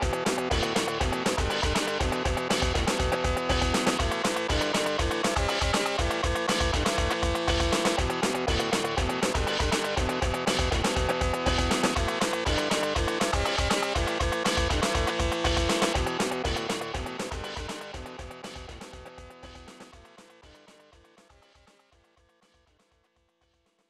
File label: Victory!; Matsumura Kunihiro Den; snes